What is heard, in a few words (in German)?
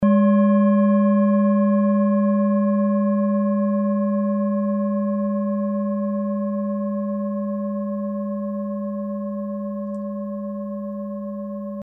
orissa planetentonschale